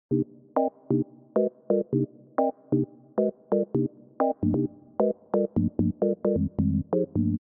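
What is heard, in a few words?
synth garage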